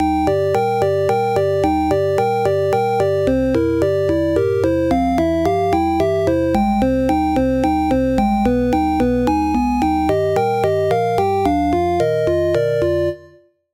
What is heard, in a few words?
Key - Bit Organ; Routed Sound; Sylenth1 Expansion - Hyper Gamer Vol.1